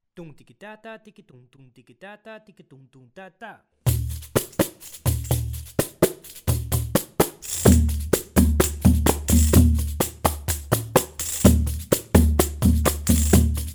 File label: Dumdikitata, pandeiro